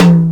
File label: mid tom e key, Royality free tom drum sound tuned to the E note. Loudest frequency: 485Hz